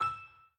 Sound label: Win Count Up End; netent; jackhammer